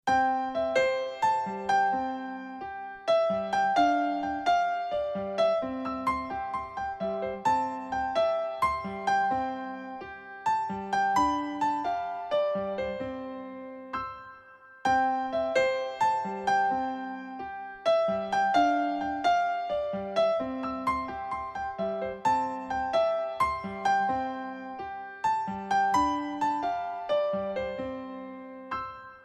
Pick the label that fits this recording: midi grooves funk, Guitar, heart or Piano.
Piano